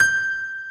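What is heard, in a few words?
acoustic piano; Pro-Rec - Synthsonic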